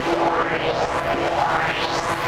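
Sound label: Arpeggio Fx, 105bpm; rhythmic inspiration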